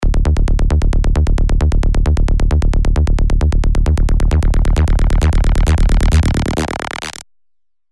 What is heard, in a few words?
Acceleration, Spire; alonso 18 year anniversary pack